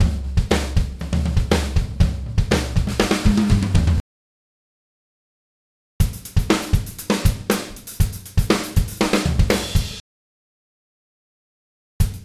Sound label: Modern Rock Drum Fill 120 BPM; Ударные (Drum Loops)